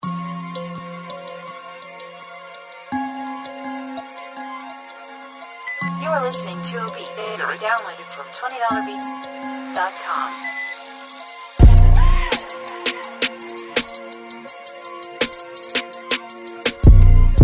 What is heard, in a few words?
Interlude, Trap beats